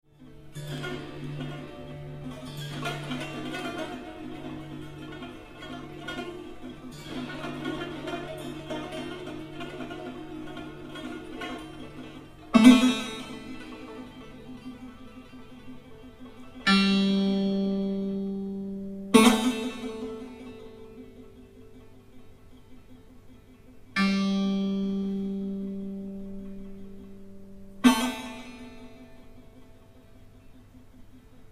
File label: Koto